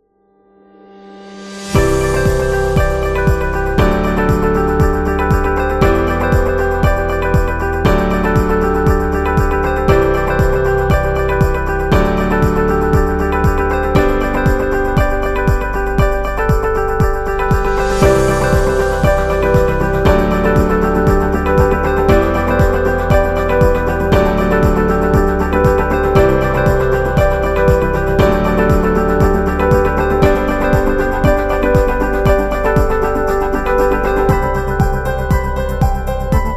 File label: Simple Corporate; corporate music vol 4